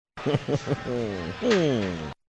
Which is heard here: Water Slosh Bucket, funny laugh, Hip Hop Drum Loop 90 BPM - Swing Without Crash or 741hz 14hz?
funny laugh